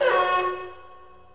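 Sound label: construction site alarm; Star Wars Obi-Wan; XBOX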